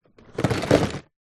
office felt tip pens pencils other stationery tip side plastic tub